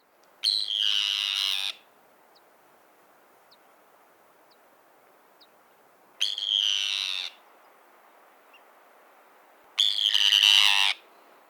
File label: Arctic Tern Sound